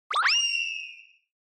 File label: Motion Pop